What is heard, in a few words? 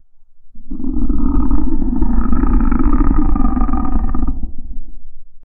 sound of murloc, croaking